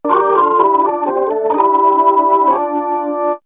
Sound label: Piano Organ